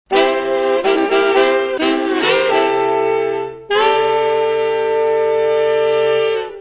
jazz; SAXO

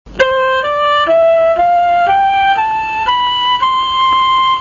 FLAUTA, flauta de pico o flauta dulce

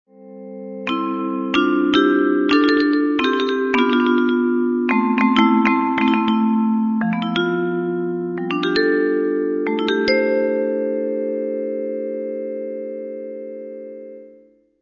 VIBRA, vibrafono

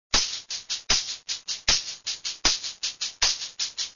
pandereta

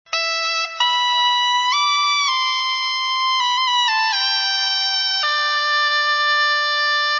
dulzaina

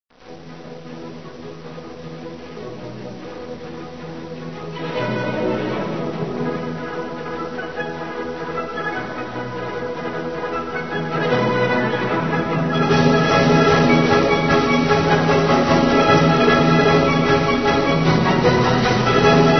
obertura

